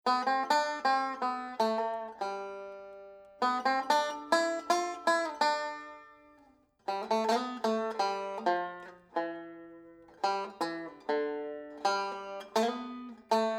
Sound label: banjo